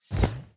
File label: Panel Move; Rabbids Big Bang; Android iOS Windows gamerip 2013